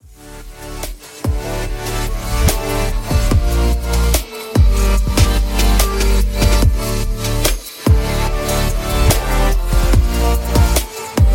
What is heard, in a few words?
golden instrumental